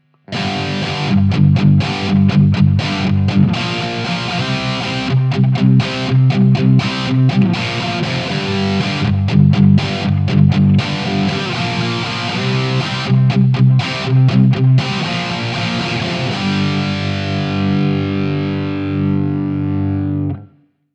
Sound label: drive on